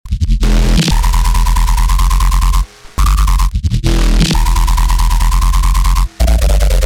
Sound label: Dirt Emin, 140